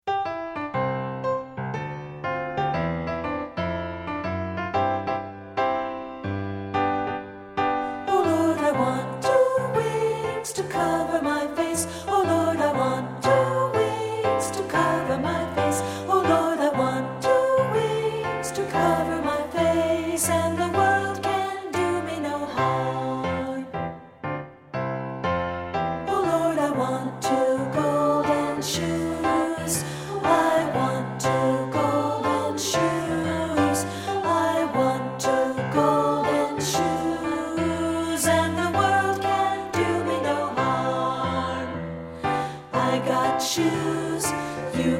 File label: SSA ALTO; treble; I Want Two Wings; partbypart